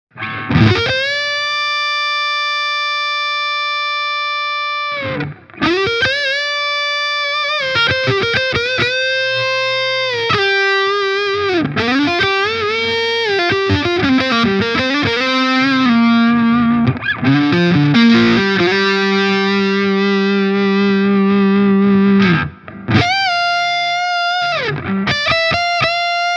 Gibson Les Paul Tribute Hals verzerrt

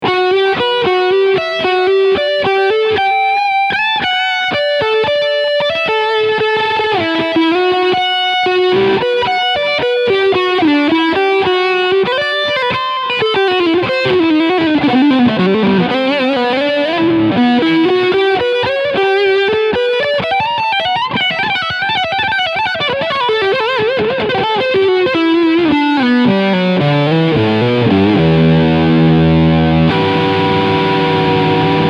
Leadsound Steg

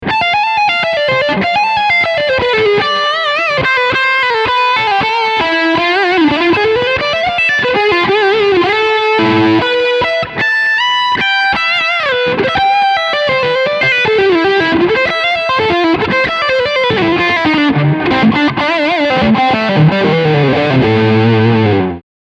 Leadsound Steg HB

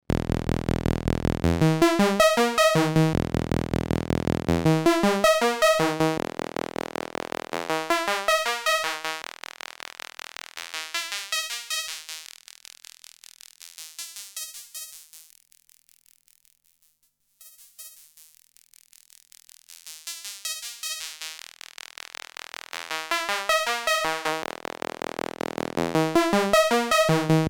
Klangbeispiel Lamda

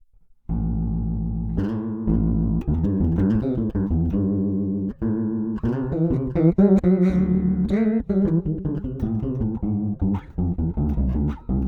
Alle Regler 100

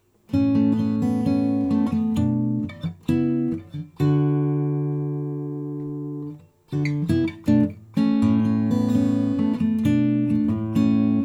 gitarre niere; blue yeti

